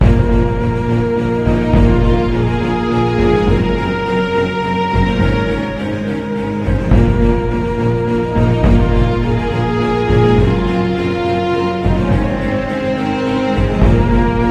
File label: Medium Drama; Magic & Mayhem: The Art of Magic